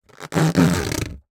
balloons friction hand short; Sonniss GDC

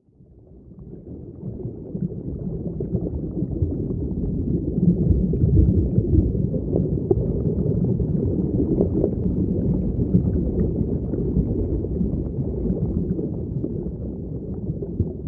underwater designed ambience abyss; sonniss gdc

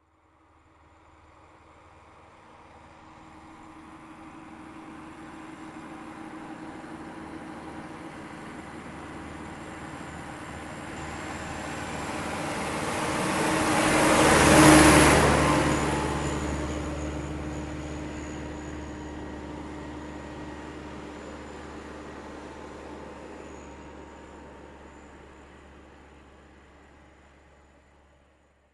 massey ext passby faded short; sonniss gdc

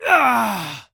male shout of pain; fvtt sonniss gdc audio

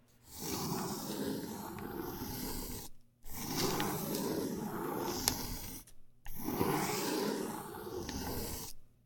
pencil draw circles; sonniss gdc

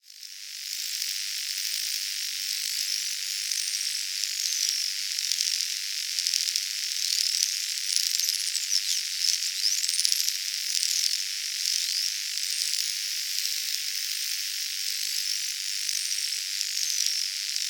ambience; bluezone; fvtt sonniss gdc audio

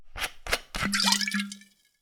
open glass bottle twist cap, food and drink; consumables

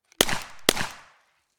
hk vp70 9mm on gun clean double shots; dpa4062; fvtt sonniss gdc audio

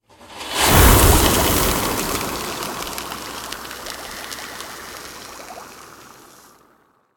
elemental acid cast; sonniss gdc

